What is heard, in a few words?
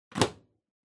Power Strip Floor Hard Plug In